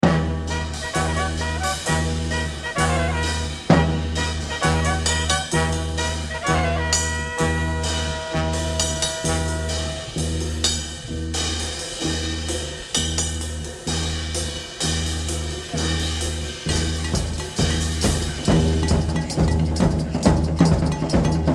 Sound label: crazy drums